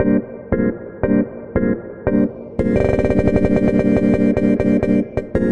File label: 174 moody synth hits into stutter wobble